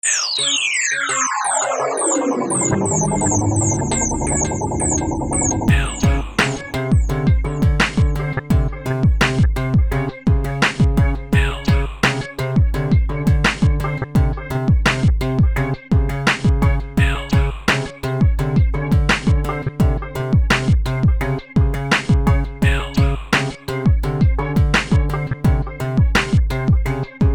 drum synth beat

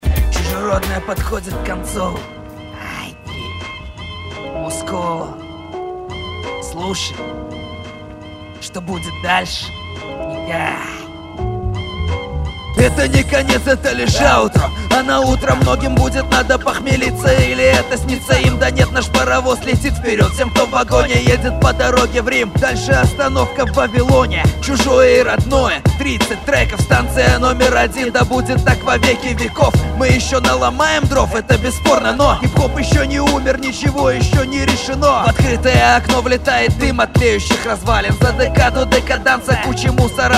afrojazz instr

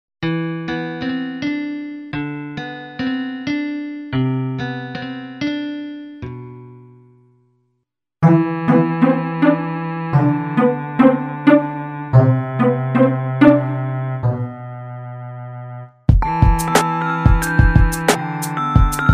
edirol instrumental

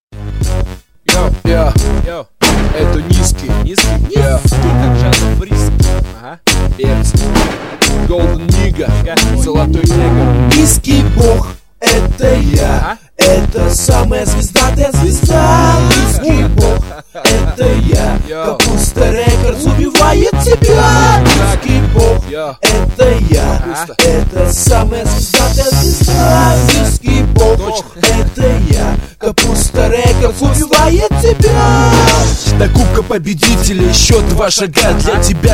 lowbass low

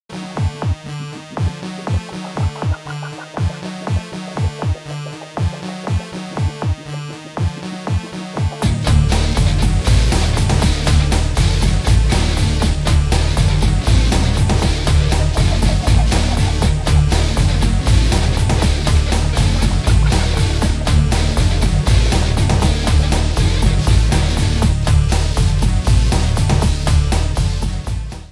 minus guitar

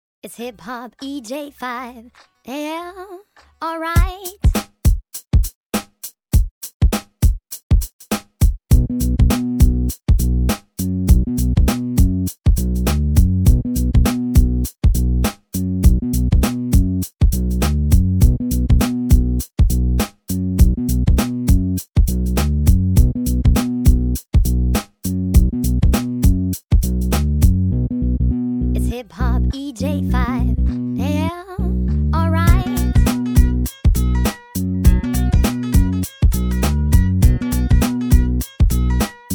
hip-hop

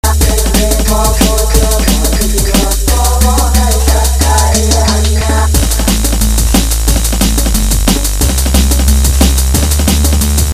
african tribal

